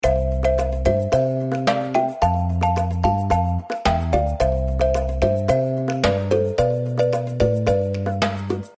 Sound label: bgm diamond shop